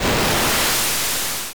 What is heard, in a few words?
Quiet Wave; Trade-Winds